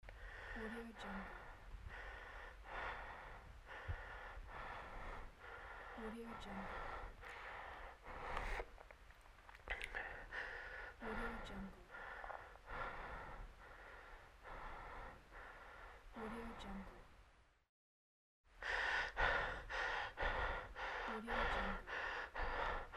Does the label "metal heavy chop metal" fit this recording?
No